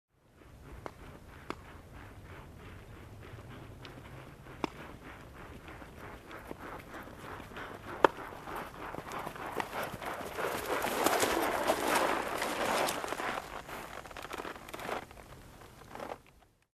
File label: Horse Gait incoming